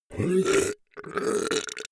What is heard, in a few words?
beast choke deadly